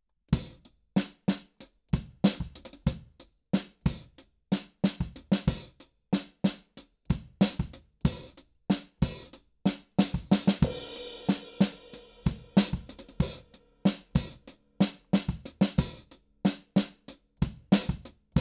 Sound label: KIT